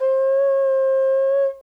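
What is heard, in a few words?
Mozambique horn, Afro horns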